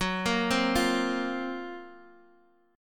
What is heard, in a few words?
F sharp Major 7th Flat 5th F sharp, Guitar Open D; x,9,8,6,8,x